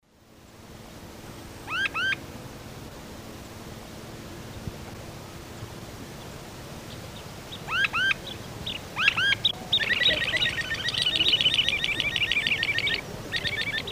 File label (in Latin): Hoploxypterus cayanus, ground call and flight song; S. America; Eastern Lowlands